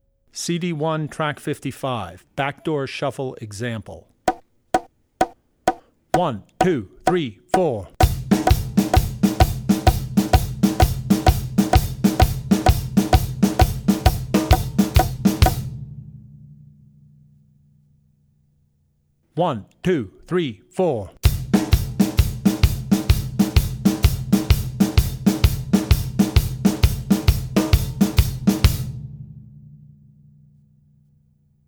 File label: Backdoor Shuffle; Drummer's Bible Tracks